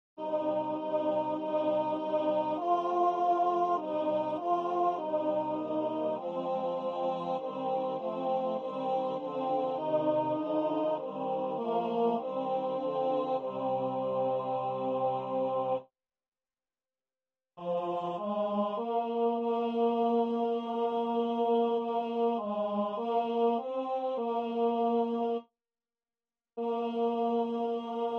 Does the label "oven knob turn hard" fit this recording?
No